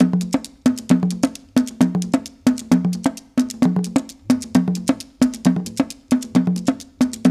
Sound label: JU JU, African Contemporary; Spectrasonics; Supreme Beats